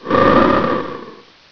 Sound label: freeze